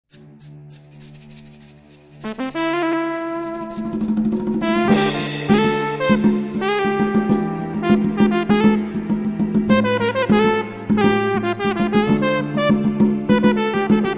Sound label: jazz bossa